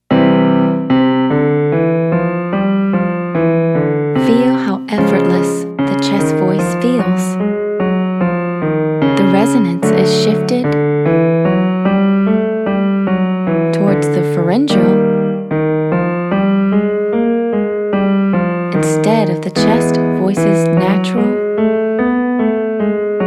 Exercise 1 NG Your Turn males

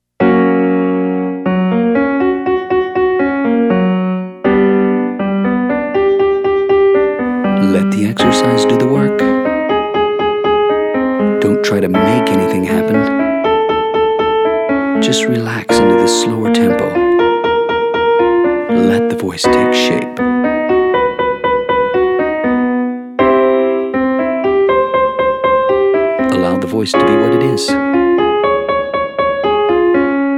Your Turn female

